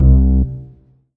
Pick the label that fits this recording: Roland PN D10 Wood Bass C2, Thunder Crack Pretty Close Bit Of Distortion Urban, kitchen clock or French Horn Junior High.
Roland PN D10 Wood Bass C2